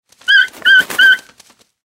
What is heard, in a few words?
fly Past; tiki-beats; eyecon